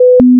sentry stand down; snds jack gmod

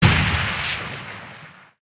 troika fire stop, turrets; enemy; gow

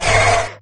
pain, imp; doom3

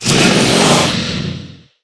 worm ouch, giant worm

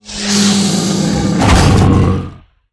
worm striking bridge

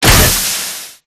gatling overheat, apc weapons; gow

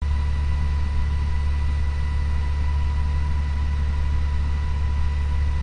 motor loop; gow; silverback

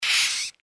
giant rat pant; npc

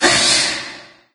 chomp; doom3; forgottenone

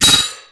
glaive hit world

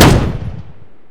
ah64d cannon; wac

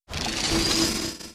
railgun charge

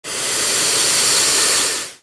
attack

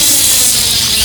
lightning loop stake

